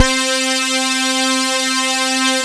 PULSE ATTACK

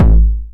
909 BD Tape Sat; Goldbaby Tape808 & Tape909; The Tape 909

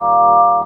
talk box chord ah

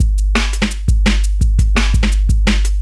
Beat, Drum N Bass

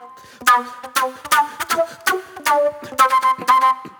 Spit Plate Flute; Sonic Foundry (Sony Creative Software); Manhattan Flutes; Session 03 - Classic Caddy

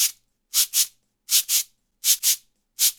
CABASA; Sonic Foundry (Sony Creative Software); Joe Vitale Latin Percussion

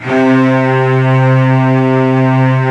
C 3 CELLO; CELLO 1

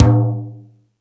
Rap Session, E-MU Pro-Cussion

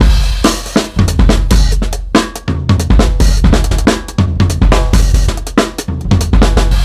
Boha Beat, Breakbeats, Drum n Bass; KJ Sawka; Drum n Bass & Beyond

